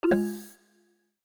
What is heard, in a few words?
Voice Suppression Off; Medal